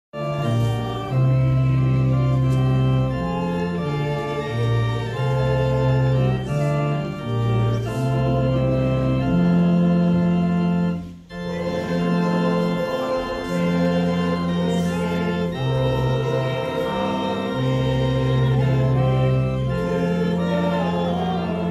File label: Freedom Hymn; God Bless Our Native Land